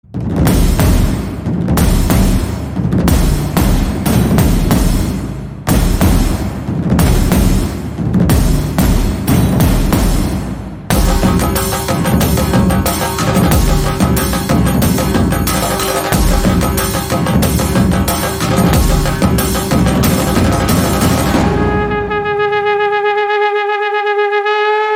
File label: Beats Theme Music BGM